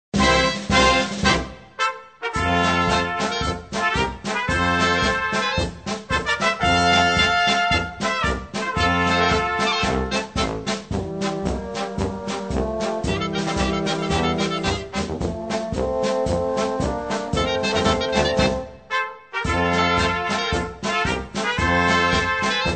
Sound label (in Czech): soutezni polka